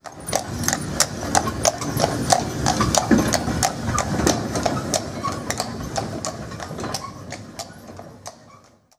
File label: horse tram; Simutrans; Pak128.Britain-Ex